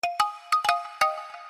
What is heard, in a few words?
Tropical Notific